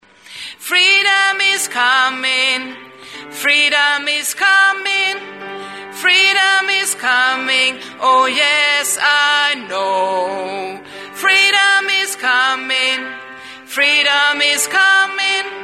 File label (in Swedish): bas, stammor